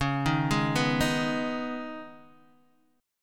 C sharp 7th Suspended 2nd, C sharp, down, Guitar, Open D